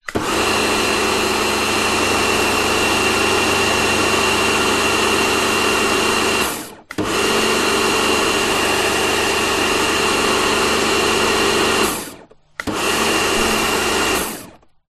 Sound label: industrial pressure washer kartcher k2 on run three bursts close to motor; zapsplat